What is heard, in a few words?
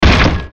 Hit Metal Crunch; Production Element Imaging Accent Transition; Metallic Sound Sound Effects